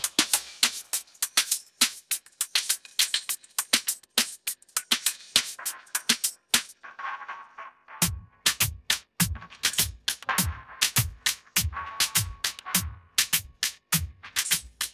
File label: ringtone reggaeton